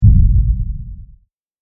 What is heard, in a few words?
Very Low Muted Bang; Bang Sound Effects; Impact / Explosion Sound Effect